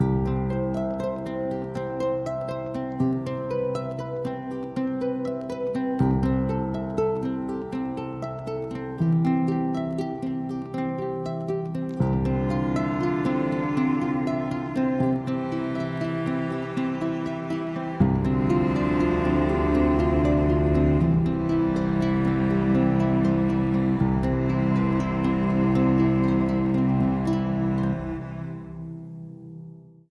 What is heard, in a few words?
Calm Underscore